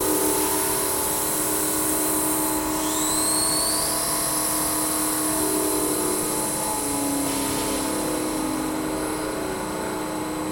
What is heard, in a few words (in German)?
Fabrik, industrielle Baustellen Schallwellen